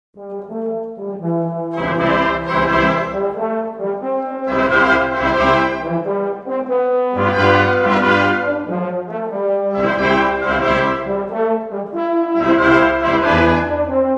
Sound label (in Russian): Духовой оркестр